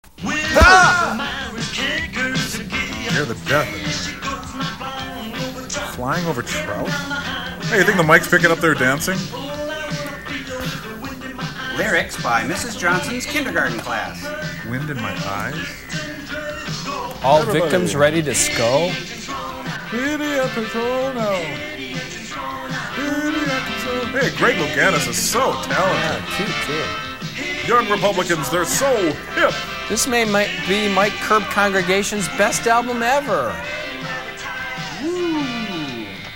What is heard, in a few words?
hear the engines roar